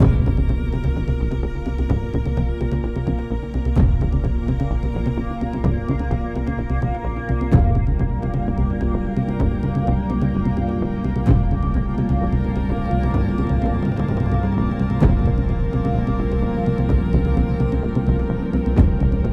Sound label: loop Tense